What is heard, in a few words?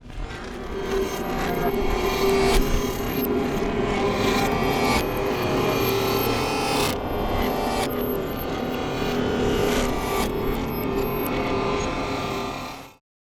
Reverse Production Element Guitar Piano Thunder Drum Bullet Shell; MatiasMacSD; REVERSE PRODUCTION ELEMENTS